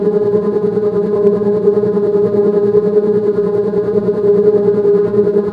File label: Clan Dojo Elevator Run; Doors